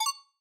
cursor select; Fisheefects

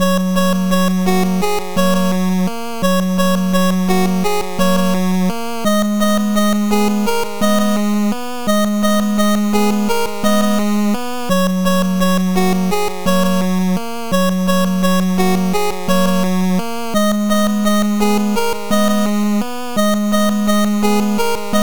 select forest bpm85; 8bit Action Stage Select

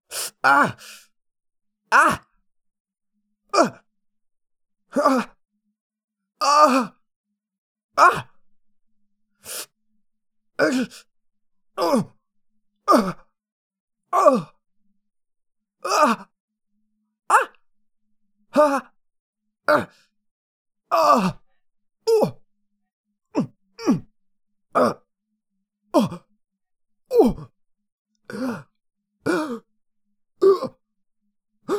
victim injured

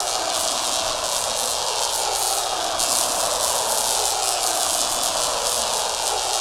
turbo; Electricity; Electricity Game Sound Pack

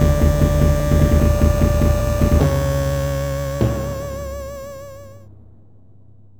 level intro

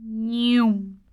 Motorcyle Nyommm Stereo

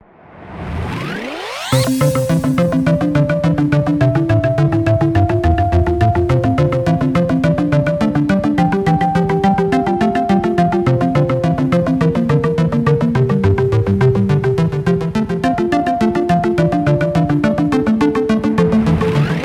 Chiptune; The Labyrinth

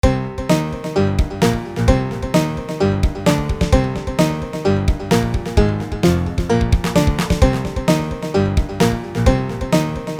banjo

